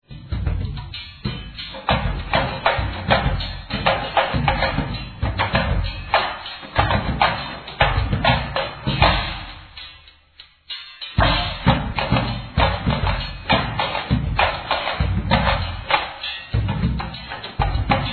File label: scrap arts